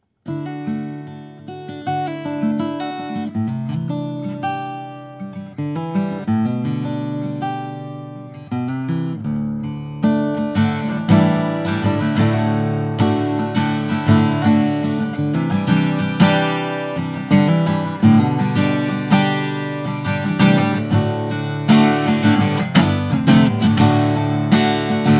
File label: Guitar